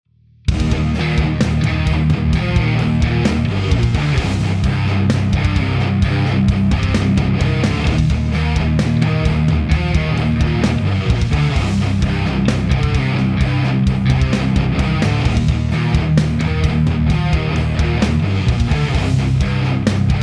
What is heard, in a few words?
drums bass; 6505 demo